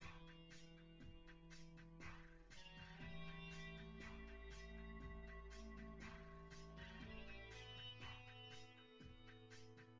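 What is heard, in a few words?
with drums synth conv 5cm sim; SASSEC07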